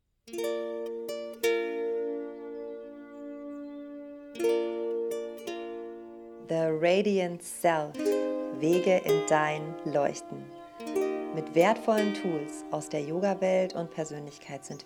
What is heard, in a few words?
Intro Music with Voice; The Radiant Self